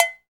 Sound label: COW, Cowbells; Roland L-CD701; PRC Latin 1